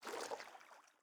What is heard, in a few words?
footstep water med; Rust